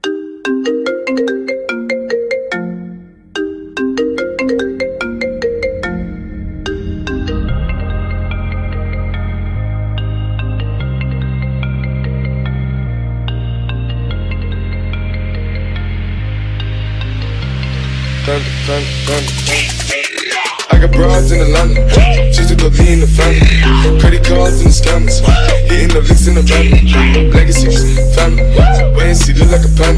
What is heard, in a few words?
Marimba